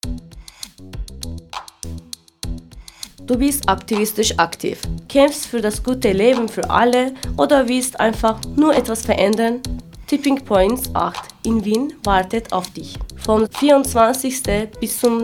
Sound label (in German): jingle deutsch; tipping points